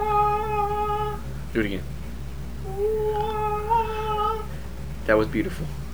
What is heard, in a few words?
waaaa